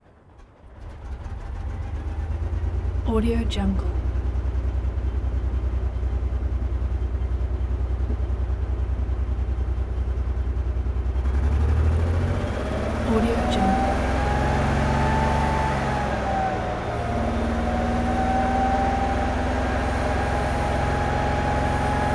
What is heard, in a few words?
military tanks m42a1 duster tank; game; روشن شدن و کار کردن